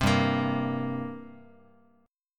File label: G Minor Double Flat 5th, G, Guitar; strummed; JGuitar